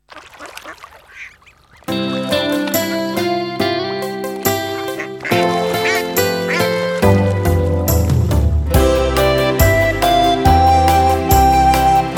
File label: recorder